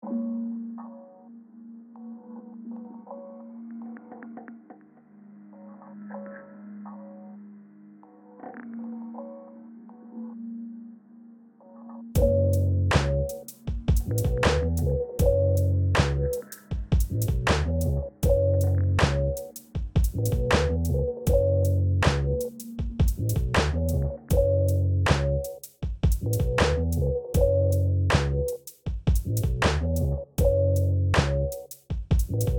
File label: lofi 79bpm